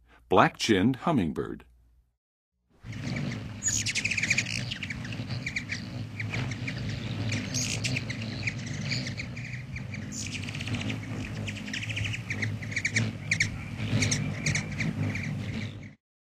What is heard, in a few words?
Black-chinned Hummingbird, Western USA Songbirds; North America